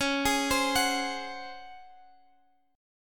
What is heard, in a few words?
C sharp Major 7th Suspended 4th C sharp